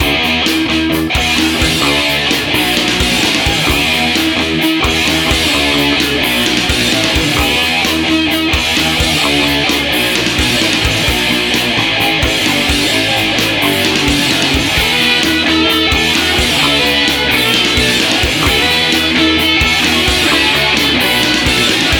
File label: Guitar Hard Rock Loop